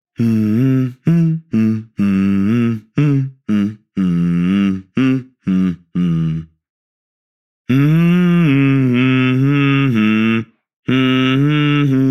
Theme Humming; the letter a horror visual novel switch gamerip 2021; The Letter Theme Humming Zachary

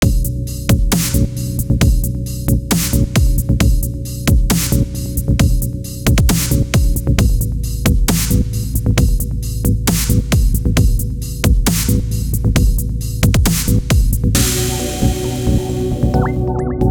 Win Win Win; The Ghost Blade Original Soundtrack 2017

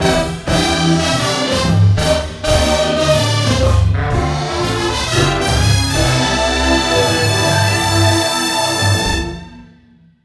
Foxtrot Echo; Tvshowgeneric

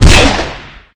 Weapons Rocket Launcher

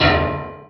HIT METAL; Mr. Bean

